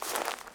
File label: pine step; Golden Crayon Sounds